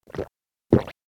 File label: Swallow gulp eating